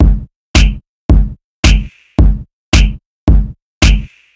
Snap, ритм н блюз

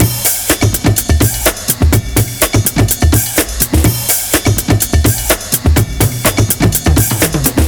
Break Kit, сэмпл ударных брейкбит

